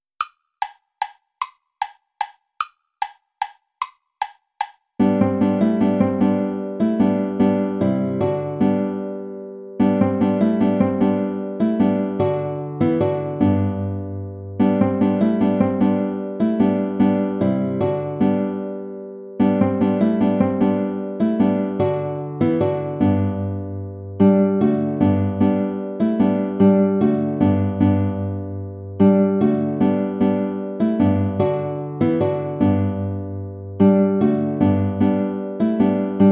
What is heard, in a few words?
volta play along without guitar; praetorius michael